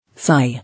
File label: Sigh